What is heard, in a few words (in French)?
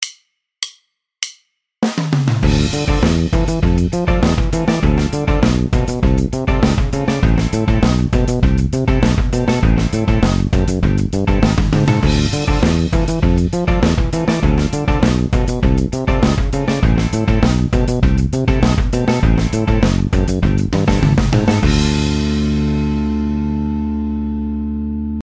octaves exercice, basse; exercices